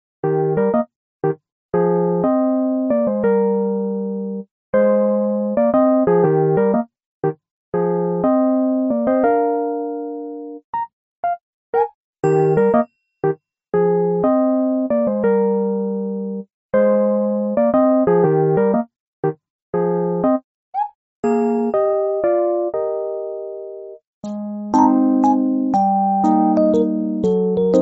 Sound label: music; dino